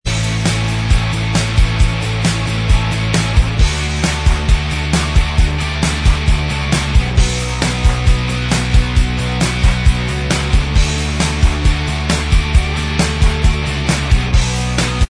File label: Inst Track